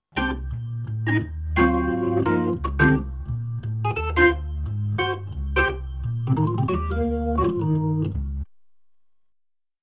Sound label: B3 Organ; Masterbits CLIMAX 8